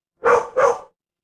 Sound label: animal dog bark